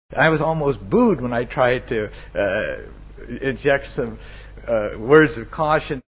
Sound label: boo